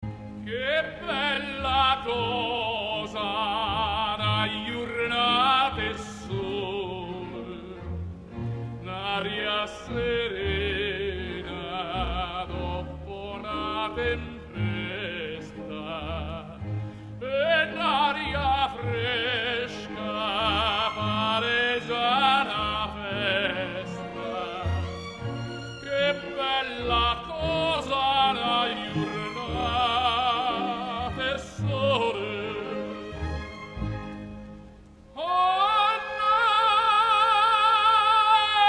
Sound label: O Sole Mio tenor; En plus lent